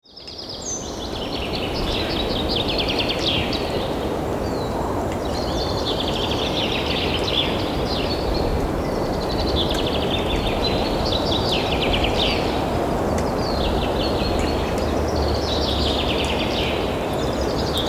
forest nature

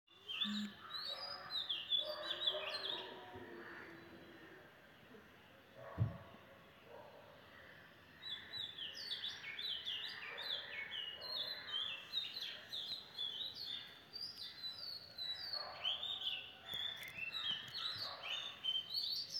beautiful morning with birds chanting

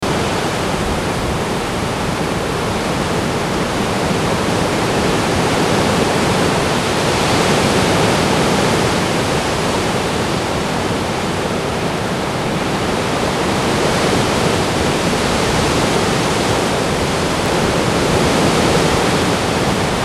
ocean waves crashing softly

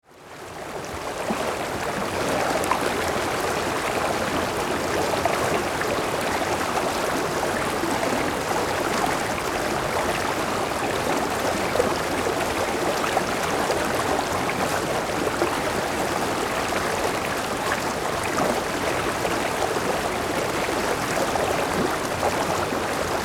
water relaxing sound; sea

